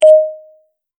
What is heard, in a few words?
messaging app notificatio